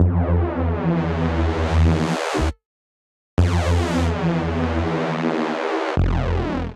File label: Hoover Down D Major 142 bpm, Bassline; MIDI Clips; Tonal; Skitter and Step; Ableton Factory Packs